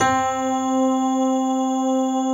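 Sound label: grand piano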